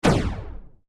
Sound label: Science Fiction Laser Gun Single Shot; Lasers; SFX Bible 6